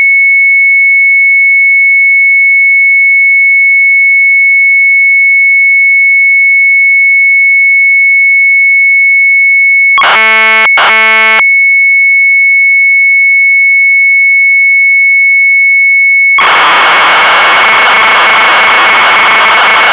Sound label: MZ800 Tape; SPIROJOUSFIGUREN; retromelsarchive; sharp mz800